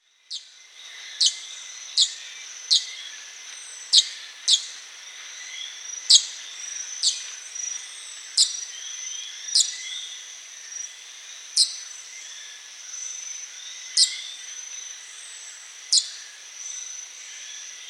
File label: Colibri madere Purple Throated Carib, chants des oiseaux; Guadeloupe